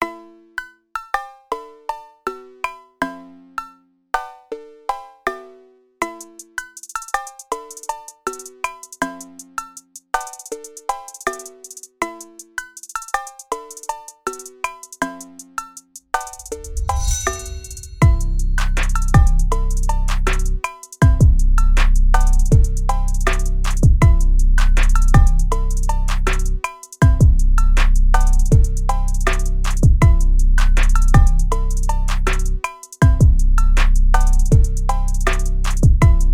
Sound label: BassBit